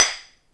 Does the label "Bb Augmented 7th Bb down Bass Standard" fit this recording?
No